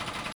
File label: generator gas; Soldier Of Fortune II Double Helix; XBOX; ambience